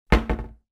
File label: cabinet door close